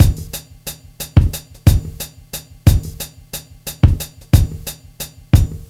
90 BEAT, Hip Hop R n B

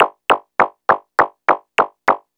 Percussive, Drums & Percussion, Beat Components; Electrocution

